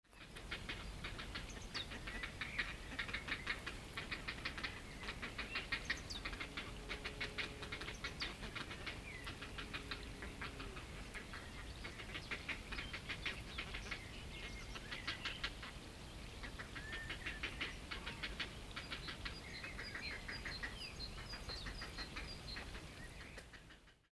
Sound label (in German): Graureiher-Küken bei Fütterung, nahe Wassereinlauf Reinigungsteich